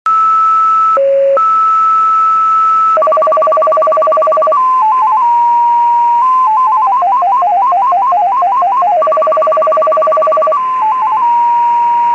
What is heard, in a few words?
XPA 20 baud, polytones